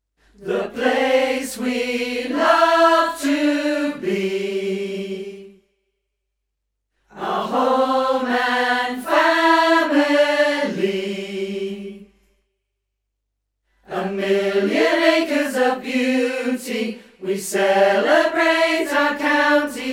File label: Tenor Chorus; Suffolk Song